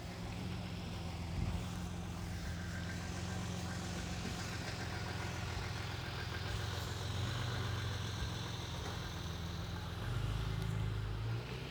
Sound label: car